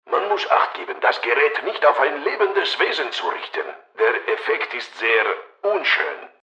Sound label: sing